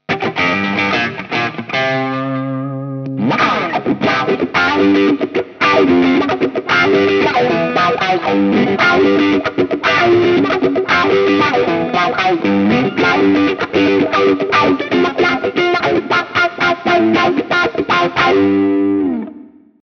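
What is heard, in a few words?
overdrive; wah wah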